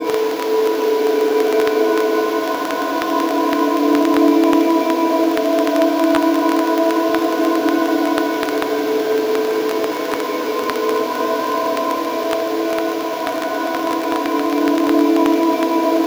VINYLSNDSP

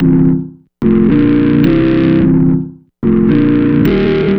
Bass, Synth Bass Loops; Sonic Foundry (Sony Creative Software); Groove Elements

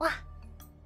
Wah, vocal

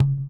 THROAT; Spectrasonics; Metamorphosis; THROATERS

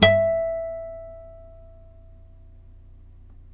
NYLON HARM, GUITARS; Synclavier Sampler Library

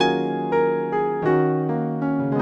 Piano, Acoustic Pianos

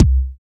BD, BD Dance

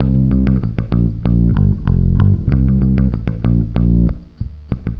BASS, BASS GTR

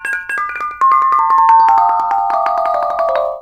KONGWONG; Spectrasonics; Heart of Asia; KONGWONG PH

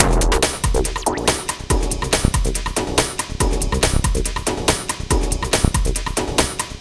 beat crankin (w bass); beats; Sonic Foundry (Sony Creative Software); Ugly Remnants VOL-2

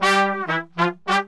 BRASS SAMBA; Best Service ProSamples vol.14; AKAI

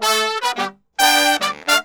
brass 110 G, brass murga; Best Service ProSamples vol.14; World Grooves; AKAI

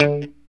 POP D 3, STRAT guitar

